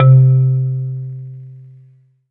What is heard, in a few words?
Korg 01W Marimba C3